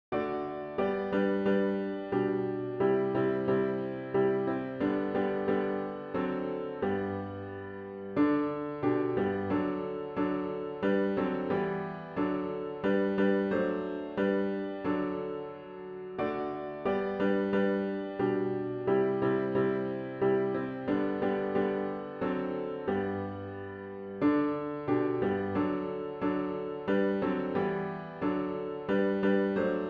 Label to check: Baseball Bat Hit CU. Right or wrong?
Wrong